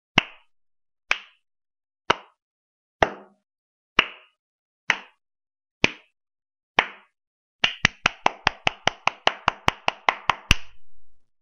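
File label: hand clap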